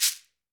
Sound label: Latin Shakers, shekere